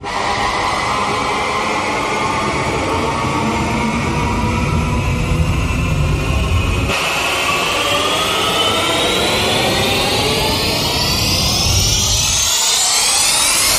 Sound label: Rise Short Escalate